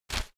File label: whoosh shredded paper soft deep; swooshes-swishes